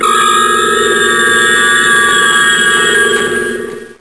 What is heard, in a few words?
beam